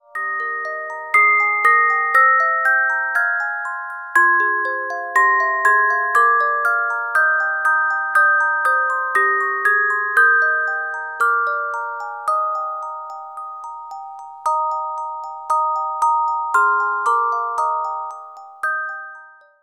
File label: Carillon Music Box Melodies